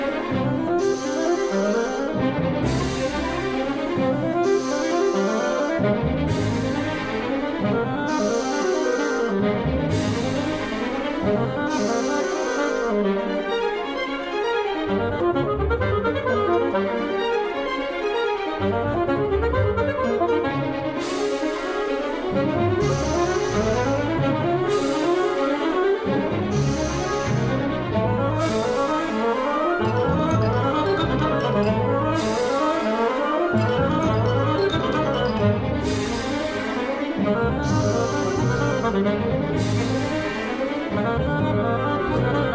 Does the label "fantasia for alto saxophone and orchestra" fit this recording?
Yes